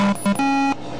victory; singe; Altered Carbon

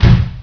switch; hurrican

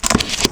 swarmer switch; cdogs

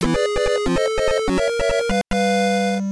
time warning; superbroswar

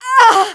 death; mohaa; dialogue

